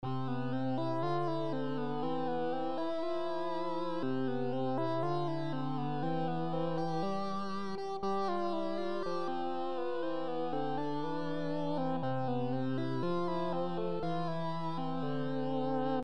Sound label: Background Music